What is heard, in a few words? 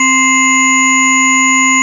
bell